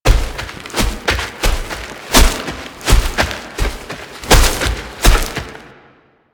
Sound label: Wolf Walk Loop; Animals; Warframe; Quests; Duviri